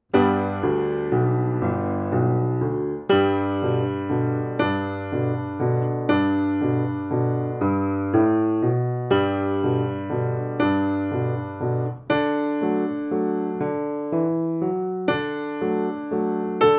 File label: Starlight Waltz 120